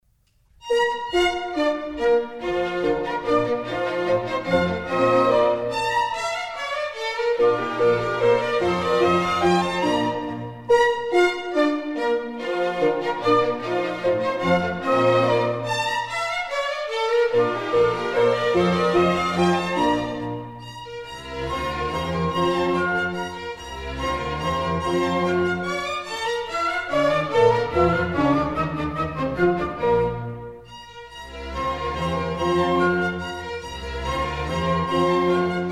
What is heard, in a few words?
German Dances, Bb major